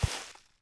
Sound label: Foot Sand; Civ4 warlords